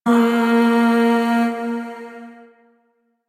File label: Void Shawzin Oct One A Sharp; Warframe; Zariman